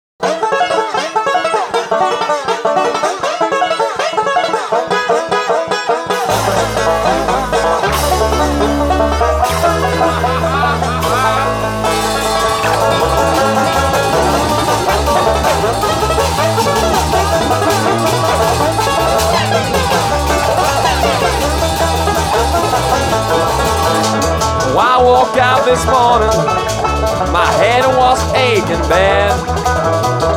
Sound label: blues